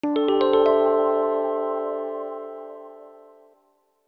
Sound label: sound logo